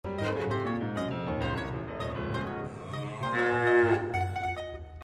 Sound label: MOOOOOO